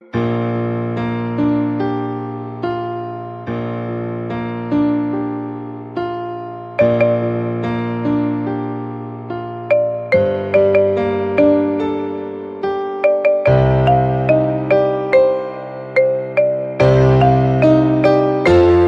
Xylophone

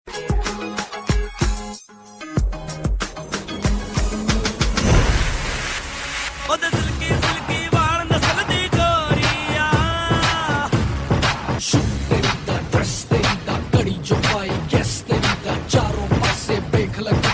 Cocktail Music

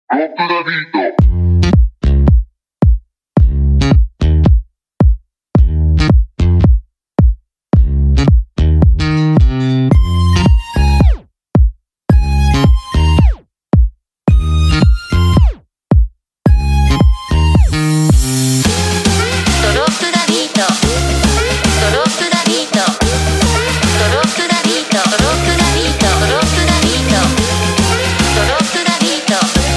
Drop The Beat

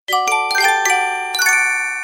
Sweet Bells Sms

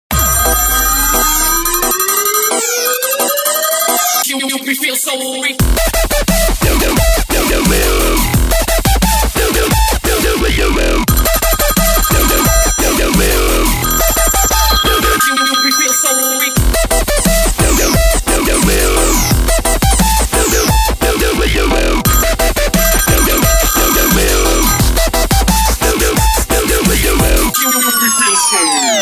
Dubstep

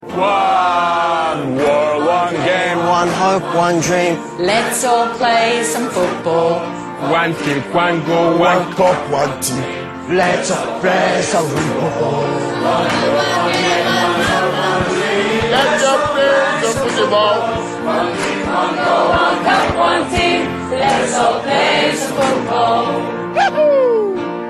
World Cup Chant

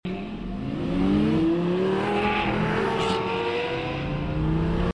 Gallardo Sound

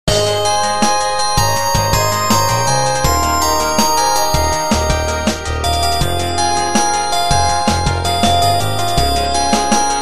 Orgel Ver; meloboom

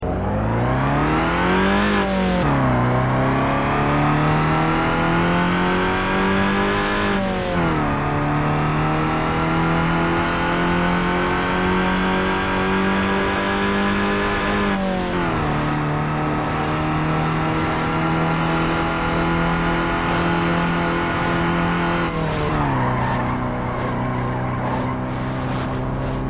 Car engine; NFSU

